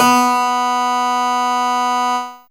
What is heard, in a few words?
H Chord B B3, HarpChim; Fairlight CMI IIe